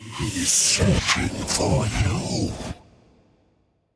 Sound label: Hiding Spot; The Town Easter Egg; Devour 2021 gamerip pc macos